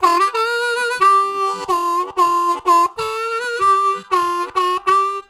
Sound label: Hanging, Harmonica Riffs; Zero G